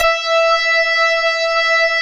METAL BR

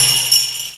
BELL BELL, perc; Zero-G; Funky Elements

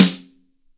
AMBIENCE SNARE

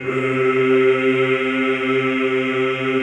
CHO C2, Aaahh Yhh; Sound & Vision - Gigapack I CD 2 (Roland)

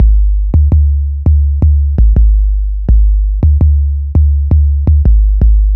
808 Standard, Bass Lines 166.5; Jungle Warfare 1&2

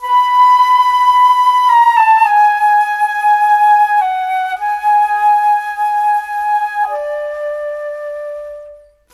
Flutes, Cinematic; Sonic Foundry (Sony Creative Software); Orchestral Series VOL-3 - Cinematic

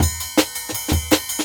Cymbal, Original Jungle Breaks

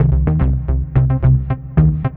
Teck Bass; Sonic Foundry (Sony Creative Software); Synchro Funk

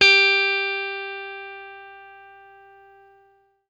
BLUE POP, Guitar & Bass; Quantum Leap; 90's Sample CDs

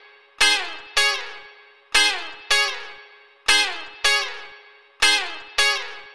Gt Chikz G#; Flutes & Swingers; Zero-G Kocktail Kollection; Construction Kitz (Lounge); Flutes & Swingers 156

